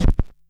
Stylus Noise, Vinyl Noise

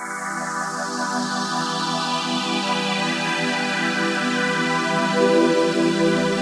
Pad Fade in, Keyboards

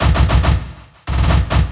Fills, Loops 140 BPM

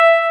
RHODES C4; ELECTRIC PF#3

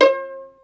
pizzi total, stringed; East West; Global Sounds

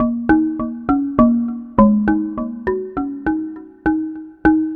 Marimba Low; Ambient; Melodic; Ambient-Melodics

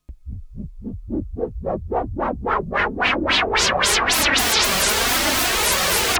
Juno-60, Filtered Noise

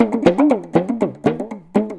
PAPAN, Indian Traditions; USB Soundscan vol.45; AKAI

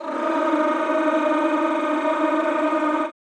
CHO Rrrr; Sound & Vision; Gigapack I CD 2; CHO Mmhh + Rrrr

